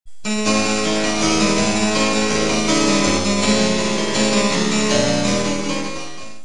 instrumentos cravo; musica classica

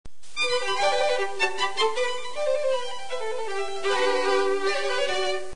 violinos primeiro e segundo; musica classica

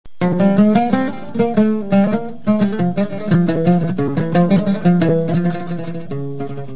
instrumentos ud; musica classica

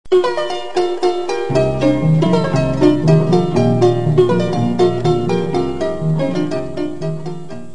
instrumentos harpa veracruz; musica classica

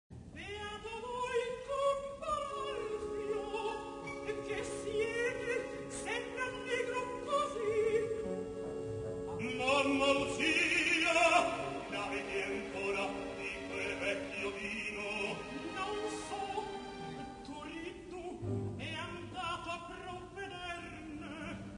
contralto e tenor; musica classica

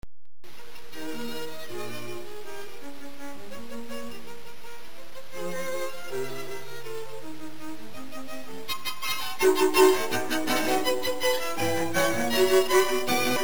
contraponto; musica classica

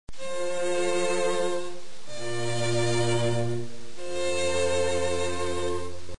subdominante; musica classica

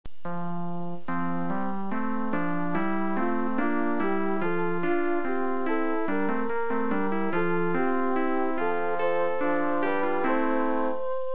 contraponto renascentista; musica classica

